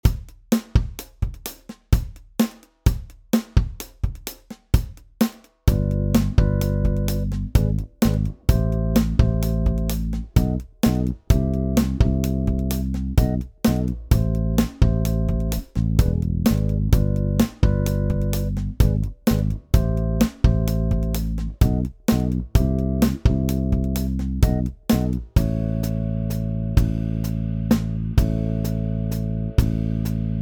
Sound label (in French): boucles